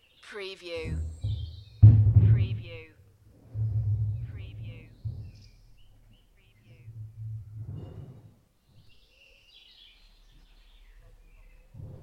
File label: Clattering in a rural farm yard; beanstalk audio